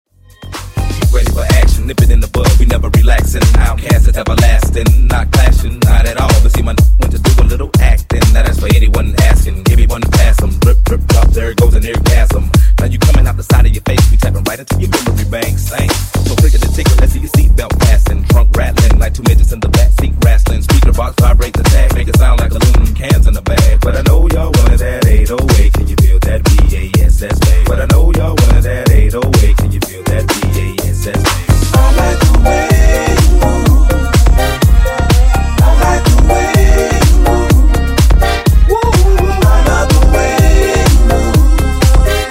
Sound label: Funk Clean 125 Bpm